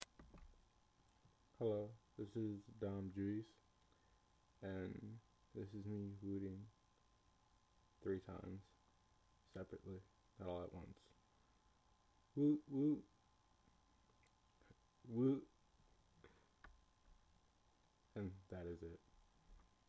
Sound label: woot woot woot